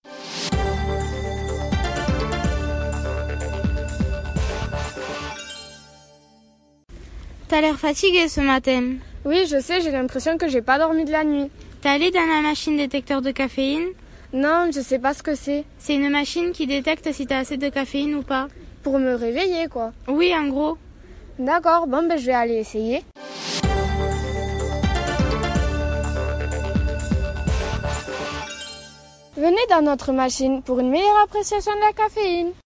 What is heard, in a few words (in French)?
MUSIQUE pub